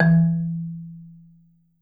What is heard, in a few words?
SOFT E2, Marimba; Sampleheads; Dave Samuels Marimba & Vibes